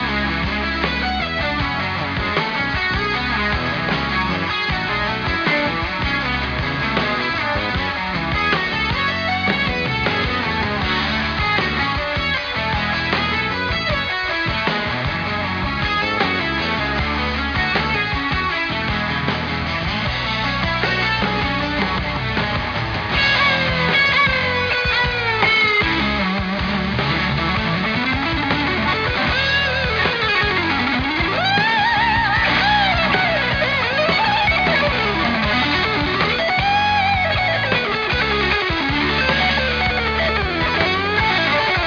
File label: music; de racetown